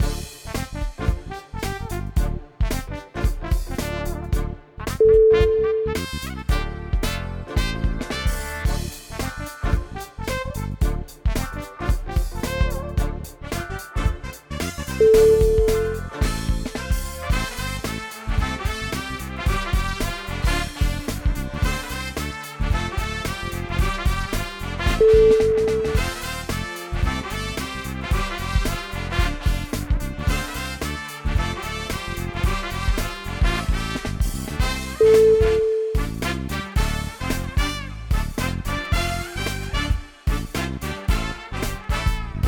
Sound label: funky night